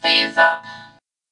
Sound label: Phase Up; LIIGHT; WiiWare